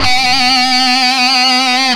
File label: Feedback, Industrial; Nasty